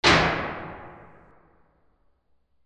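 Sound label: percussion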